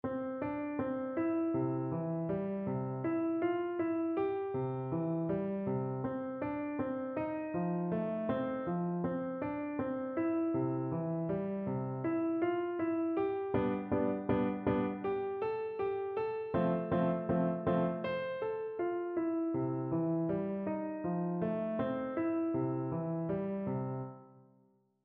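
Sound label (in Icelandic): rokk og ról; piano leikur 1 hefti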